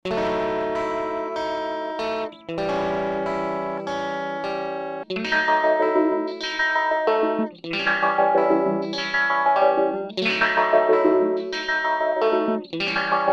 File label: downward staircase